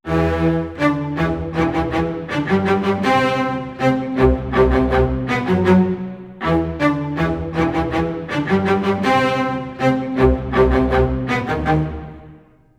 80 strings lo, Orchestral arrangements, 80 E min

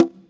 Conga; Equipped Music; Loungin House

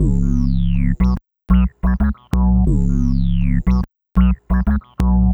Funk Riff; Sonic Foundry (Sony Creative Software); Synchro Funk

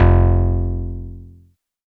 Bass, Synths, 5U Modular

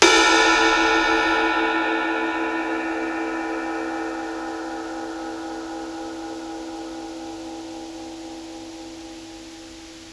Ride, Psychedelic Drums

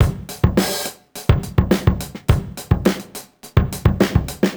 Drums Proc 105 bpm, funk soul; sampled funk soul

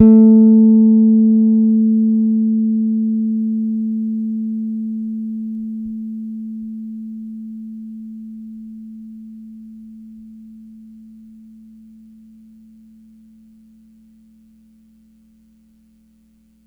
Gs A4 hard, Bass, Pedulla Thunderbass; Giga Samples Collection

